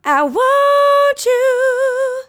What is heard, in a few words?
I Want You, Female Vocals